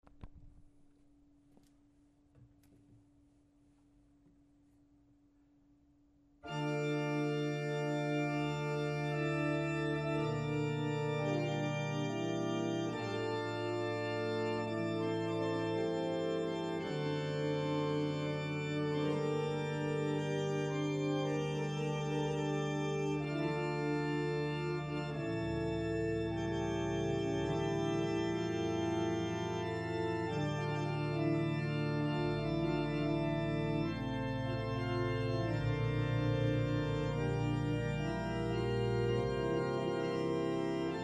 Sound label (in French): Plein jeu orgue; Grigny; 1er livre